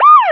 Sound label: Police; 暴力赛车